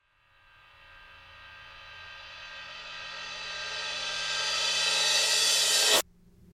Reverse cymbal

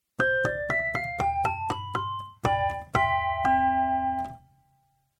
Rhodes 1946 Pre Piano

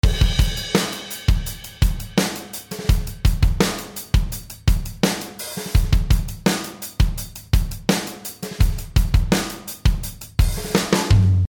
Programmed Drums; Neutron 4

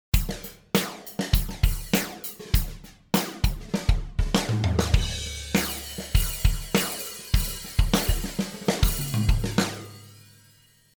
liquid drum; shaperbox

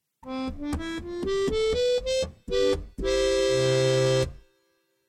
Harmochord

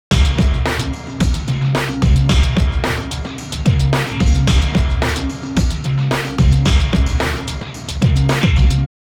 Perceptions Combo

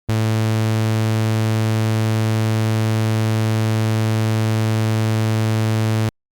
resonance cutoff

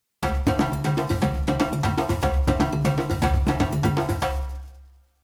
Percussion Ensemble

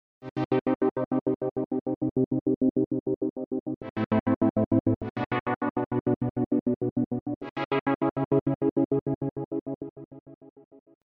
shaperbox volume synth

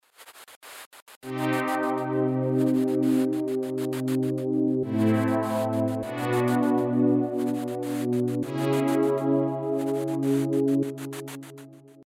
noise synth; shaperbox

